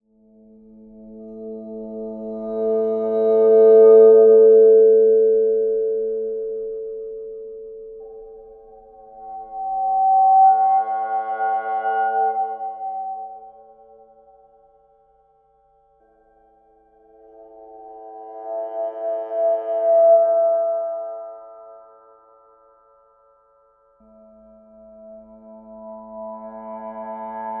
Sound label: objects Exceed Cymbal compare